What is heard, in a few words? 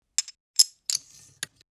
Lab Glass Test Tube Movement; Fairlight Sound Lib